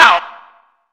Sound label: Vox Hit C3; Zero-G; Club Techno